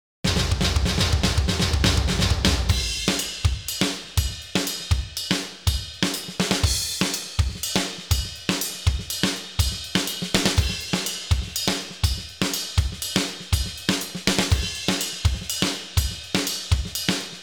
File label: drums - trash; Sound Sampling Collection VOL-5; Drums & Bass Fusion Funk Effect